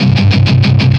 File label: Guitar Short Riff